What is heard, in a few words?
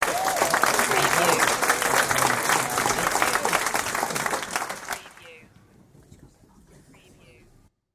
Audience Applause; beanstalk audio